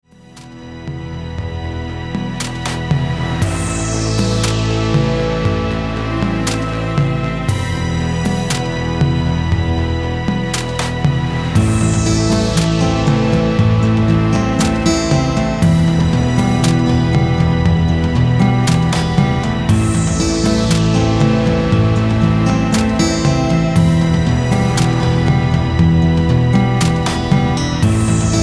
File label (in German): Analoge Wellen